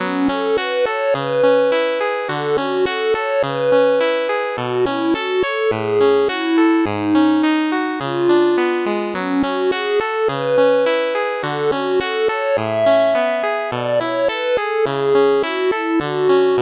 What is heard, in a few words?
8bit bgm fast